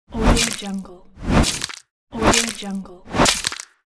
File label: sword on flesh and bones